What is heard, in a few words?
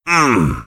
heavy meleeing; tf; duke